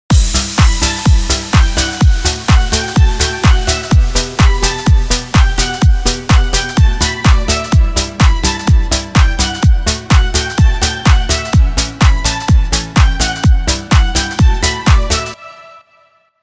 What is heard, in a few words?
with crash FX